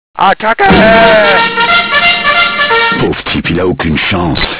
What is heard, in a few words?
prison